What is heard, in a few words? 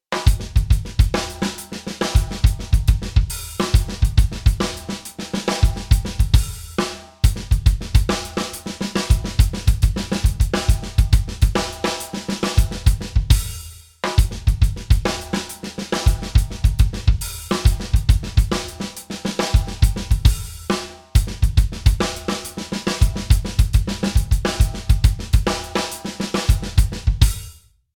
Drum Loop